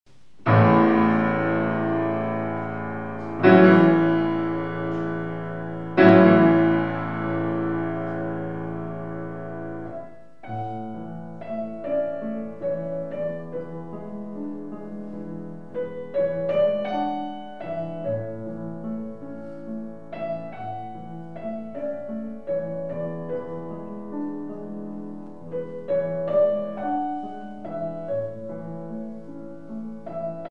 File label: Chopin Piano Recital Souvenir de Paganini Pianist live